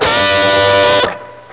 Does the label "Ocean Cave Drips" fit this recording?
No